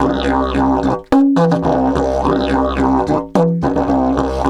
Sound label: 108, DIDGE 108BPM